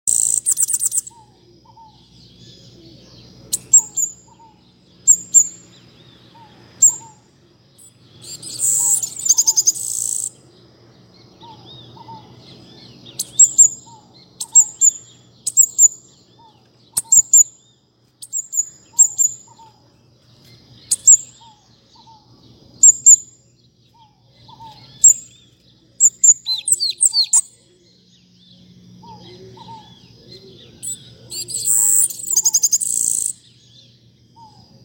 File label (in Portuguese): canto da cigarra parda